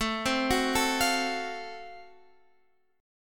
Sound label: Gb Minor Major 7th Flat 5th, chord